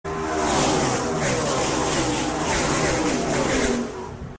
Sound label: Nascar Engine